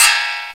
SPRING, Fairlight CMI, BELLS

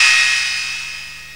RIDE, CYMBALS

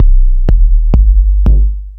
Lotsa Kicks, Drum Machines E-MU Pro-Cussion

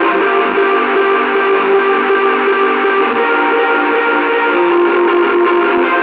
Destroyed, Synth Sequences

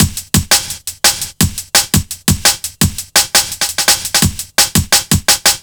170 break, Explosive Jungle

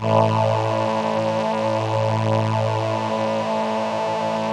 VP-330, String Machines

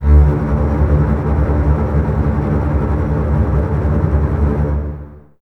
D 2, Basses Tremolo, String Ensembles; Miroslav Vitous; 90 s Sample CDs

